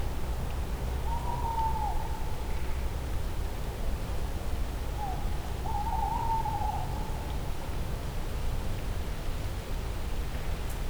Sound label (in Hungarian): éjjel kissé közelebb vízház, macskabagoly; cardioid; termeszetben